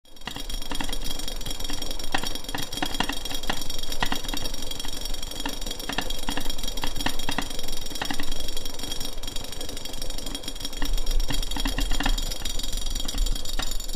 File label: Water boils in a pot, lid rumbles